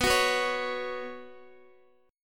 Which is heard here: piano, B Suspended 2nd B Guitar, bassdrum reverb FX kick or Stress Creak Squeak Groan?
B Suspended 2nd B Guitar